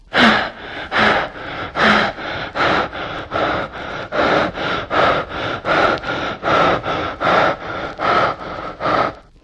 breath heavy; ooh ahh

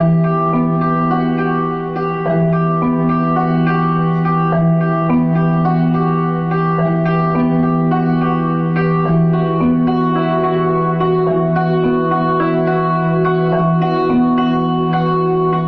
guitar and vibes, Loops, Sad

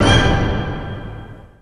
Stabs

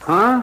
"huh", old man

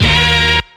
Stabs

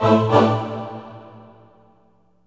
voice stabbed, Loops, Sad

